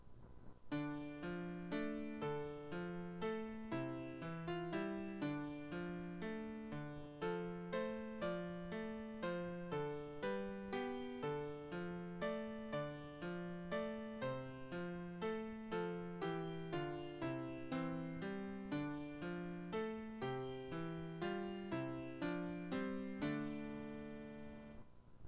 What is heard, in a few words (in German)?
klavier; Scarbourgh Fair